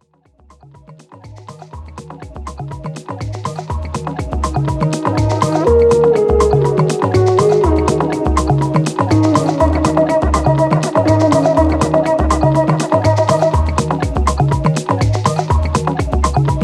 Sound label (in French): La Basse et les Shakers